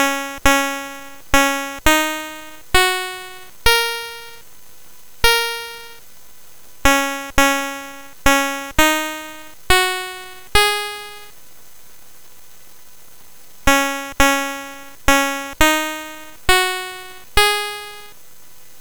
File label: Tom Dooley BASIC sid CSG8580R5